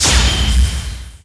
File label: power shot